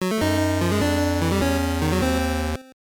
Mini-Game Fail; gbc; mickey s racing adventure

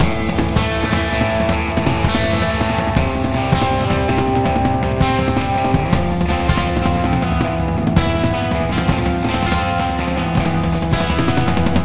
Music Cave; Beach Buggy Blitz; Android

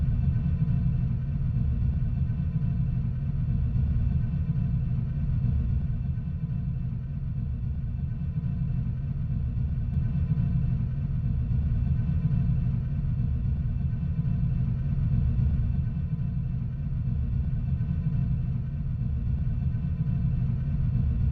Rocket Loop Ambian

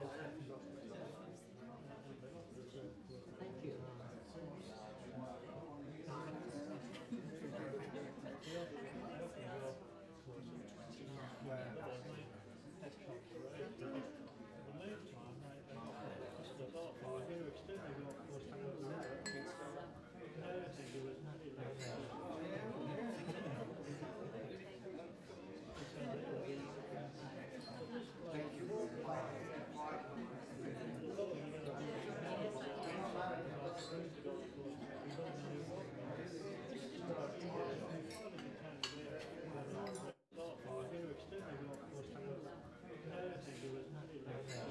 Ambient Bar; Drifting Lands

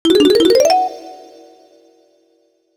Collect Watermelon Piece

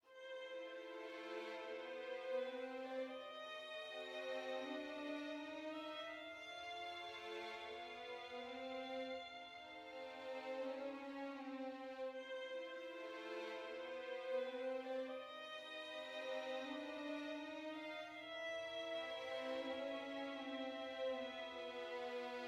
dark soft

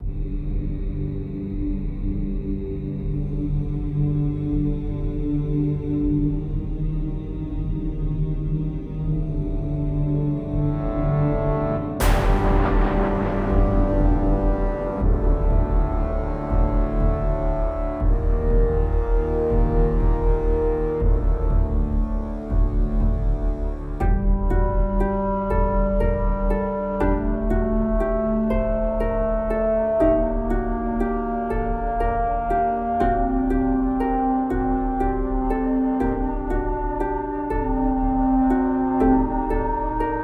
Action Mutation 120bpm Low; project zomboid

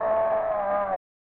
"Augh!"; Iron Commando 1995 SNES

